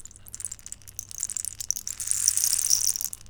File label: Rain Stick